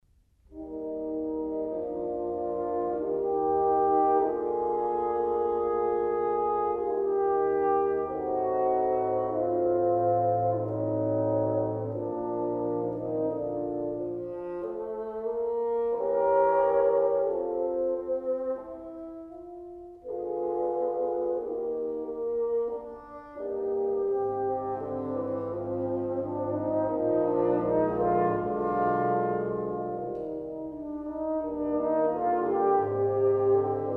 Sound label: wind octet; lachner